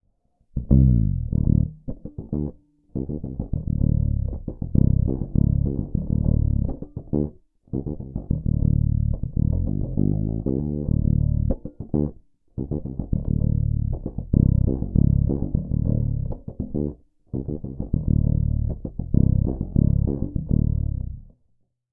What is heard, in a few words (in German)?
Bass Kuma Fretless 5 Groove One Humbucker Tonblende zu